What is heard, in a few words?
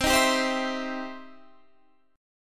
E Minor Major 7th Sharp 5th C